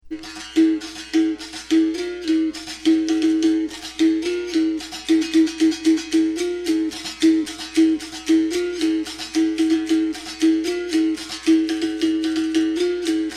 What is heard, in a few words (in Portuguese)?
toque cavalaria, capoeira; toques